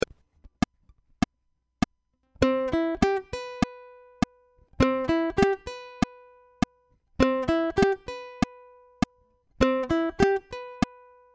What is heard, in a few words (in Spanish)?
C Escala Click